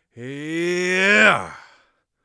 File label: magic attack, Mage Voice Pack